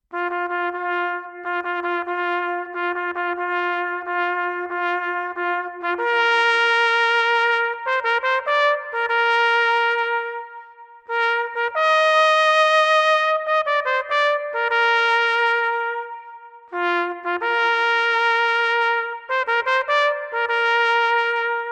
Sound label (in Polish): hejnal